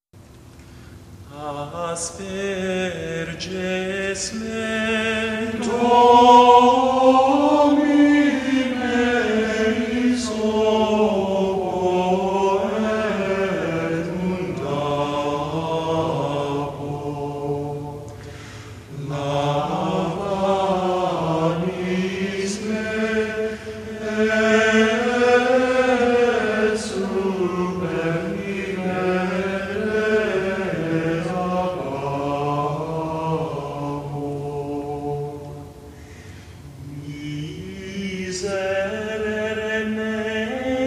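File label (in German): Lateinische Messe asperges me; Adoremus Hymnal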